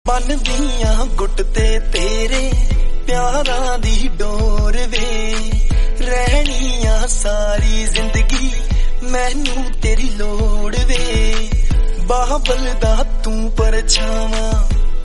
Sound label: rakhdi song, punjabi